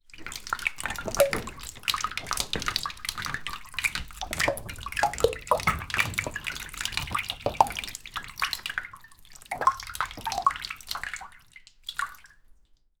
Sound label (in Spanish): deslizamiento por el inte, park